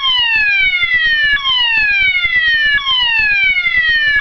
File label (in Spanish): tono